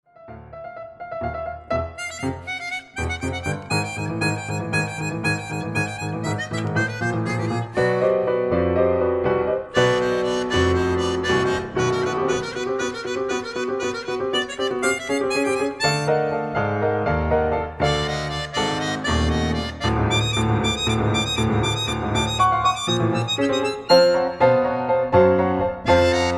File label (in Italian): Armonica